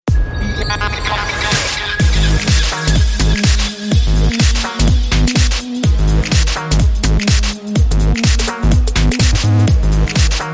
Organ Ice

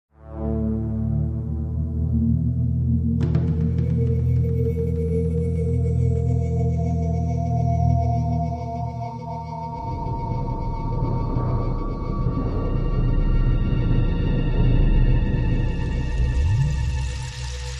Ominous Footsteps

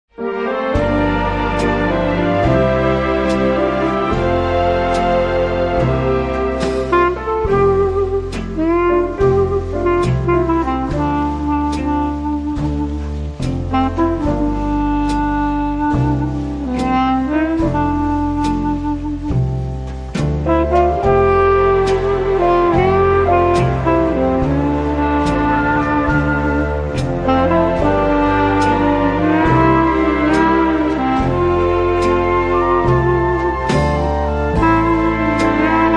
Clarinet Theme

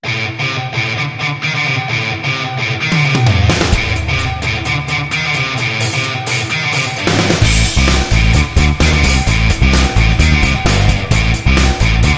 Funky Punk

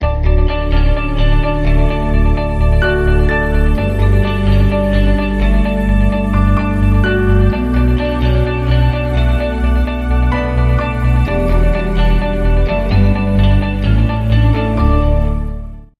Heading Home, No Drums

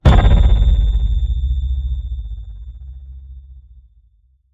Ringing Light Hit

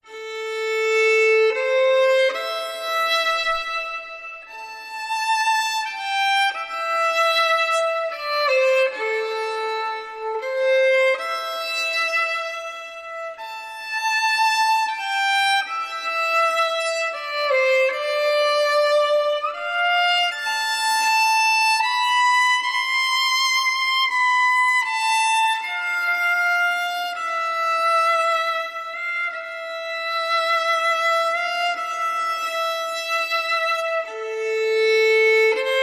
Violin

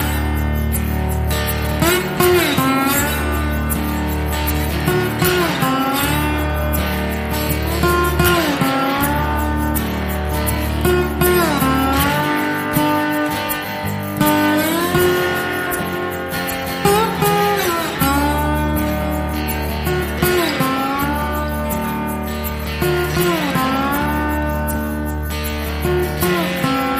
Lazy Slide

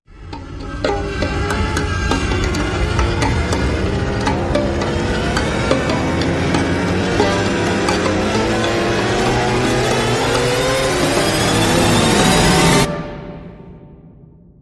Tension Plucks Rise

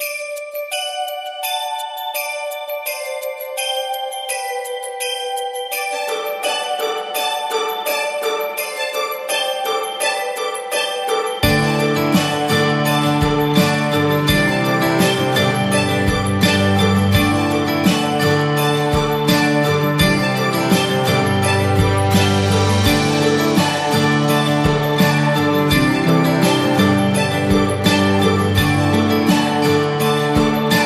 No Melody

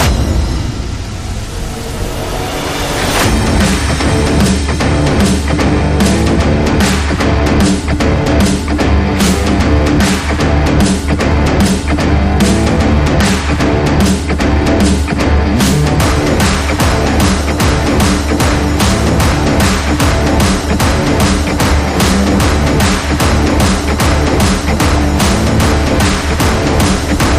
No Lead No Brass

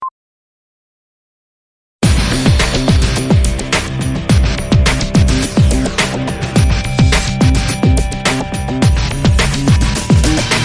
Laser Disco

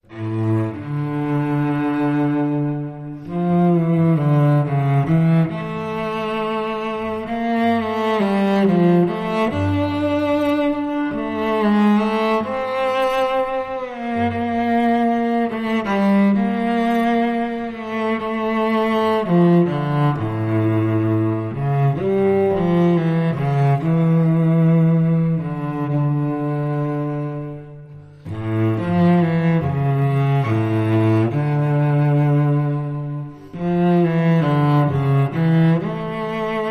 Its Over Now, Cello

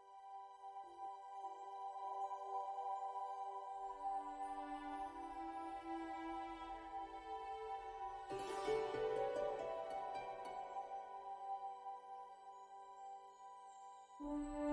No Choir

